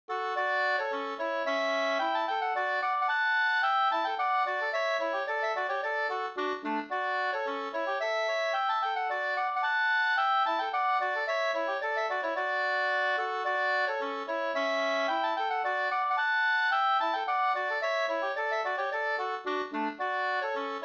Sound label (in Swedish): polska Slangpolska